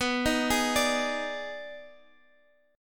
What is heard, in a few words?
D sharp Major 7th Suspended 4th Sharp 5th B